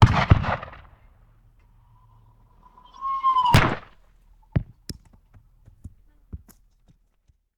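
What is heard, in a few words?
Cannon Cannonball; Sound Ideas; Guns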